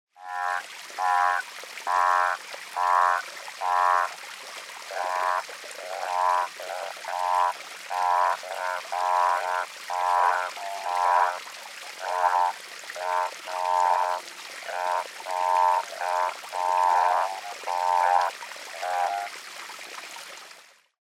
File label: Odontophrynus americanus